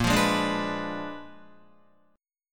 Bb 7th Flat 9th Bb, Guitar; Bb7b9; strummed; JGuitar

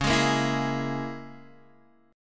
Db Minor 6th Db down Guitar Standard; Dbm6 strummed; JGuitar

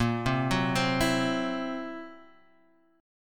C 7th Flat 5th A sharp, chord

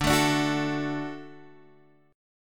D-Major, D, Guitar, Standard; x,5,7,7,7,5; strummed; JGuitar

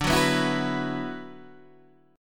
D 6th Add 9th D, Guitar, Open D

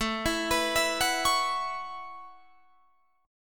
A 6th Add 9th, Guitar, Drop D

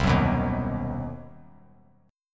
C Minor Major 7th Flat 5th, chord; strummed; 3C,3F,3A,3D; 12,13,14,13; JGuitar

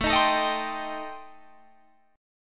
C Minor 6th A

